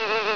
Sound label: buzz; Siege+THF